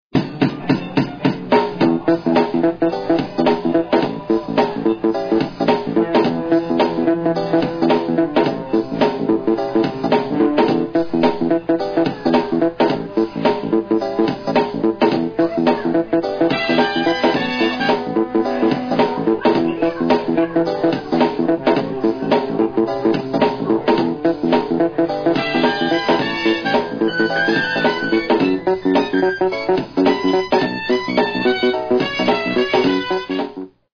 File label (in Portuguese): ritmada